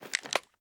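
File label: pistol reload empty mags large end; gmod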